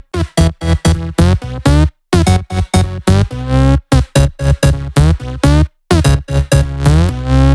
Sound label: 127BPM Melody; Vengeance Samples; Warhog Kit